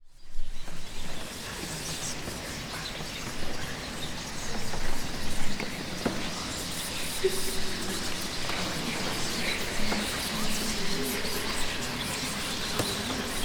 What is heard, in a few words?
CHATTER